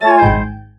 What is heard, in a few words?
Defeat